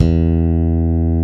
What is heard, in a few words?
TITE BS E3, TITE BASS; Drums In Your FACE!